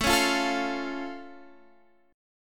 C sharp Augmented, A, guitar chord

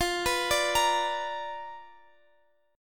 B Minor Major 7th Flat 5th F, chord

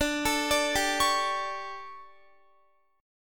D Major 7th Suspended 4th, Guitar; DM7sus4 arpeggiated

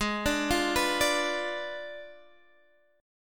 Ab Diminished 7th Ab, Guitar, Half Step Down

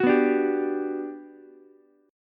G Major 7th, Ukulele; strummed; JGuitar

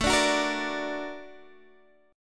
A sharp Augmented Major 7th A, guitar

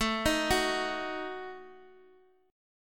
D sharp Diminished A

